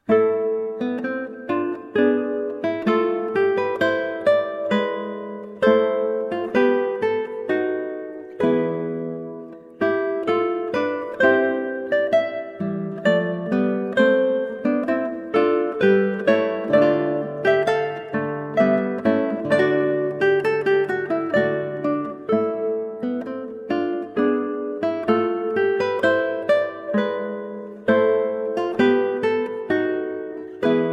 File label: Galliardo, Guitar; William Byrd